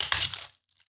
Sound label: broken glass step; Golden Crayon Sounds